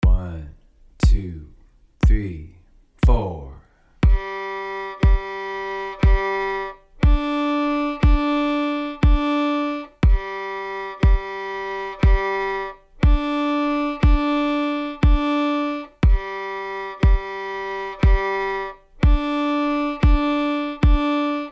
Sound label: string crossing triplets G to D, fiddle